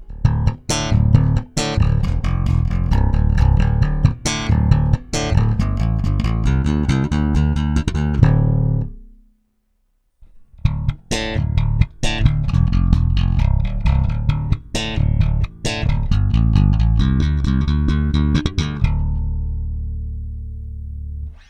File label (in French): slap, basse; a6vscorvette